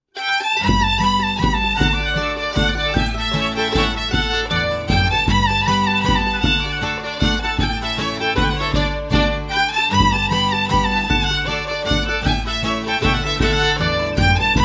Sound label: Mazurka; Libby Bird Song